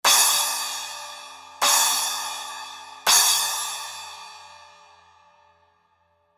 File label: 10 a zildjian flash splash hits